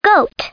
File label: goat